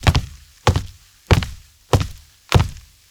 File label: walking; soundscrate